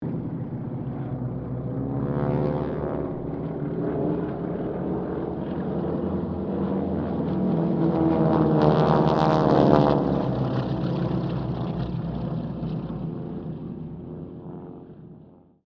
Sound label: Harvard squadron 4 pass, stereo